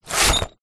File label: industrial large box of screws and nails slide movement